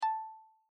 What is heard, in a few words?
click; Emojiji